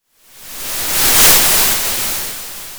Sound of waves crashing on a shore

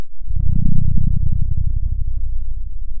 pop, Sound of a dinosaur

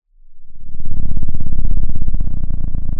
pop, Sound of an engine revving

